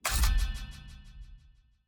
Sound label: battle preparation begin